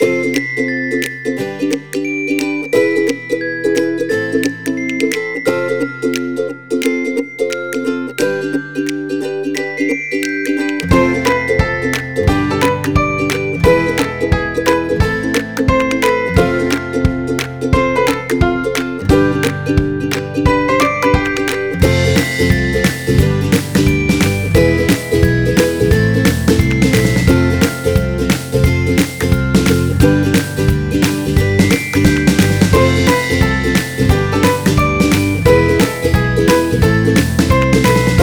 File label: Happy Music; This Happy Music